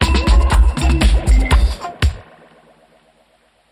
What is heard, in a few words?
Sting